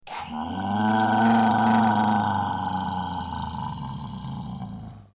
Cave; Halloween

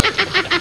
Curly Laf; Three Stooges

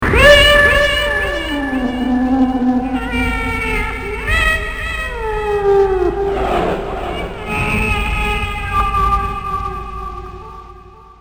catfight

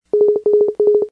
Computer Comm; Star Trek

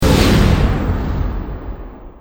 Warp Go; Star Trek

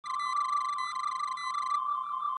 Scan Loop; Star Trek

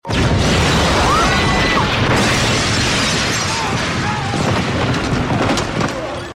Boom

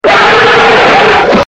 wolf sound; Halloween

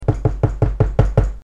knock on wood

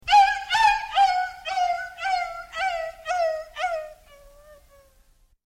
scared dog

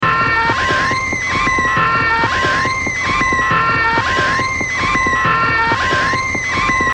Clown Alarm

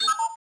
chirp; Star Wars

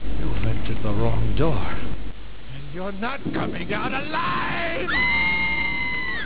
wrong door; Halloween

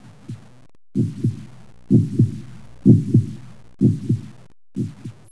hartbeat in and out; Halloween

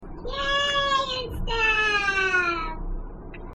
yay

